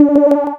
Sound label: Cry